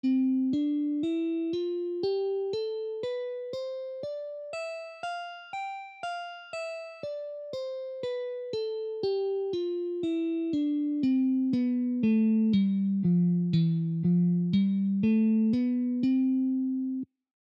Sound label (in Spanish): escala de do mayor posición 3